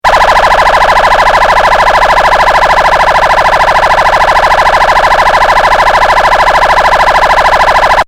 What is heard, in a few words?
Phaser To Stun, ringtones